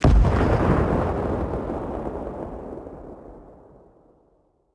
airflak; World of Tanks Blitz; ambient neptune

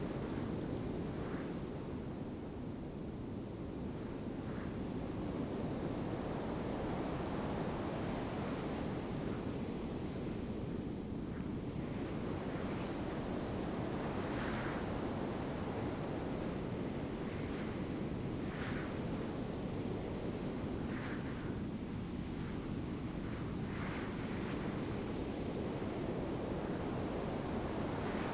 ambience field night; Rust

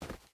footstep hide dirt run; Rust

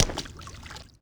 water walk shallow

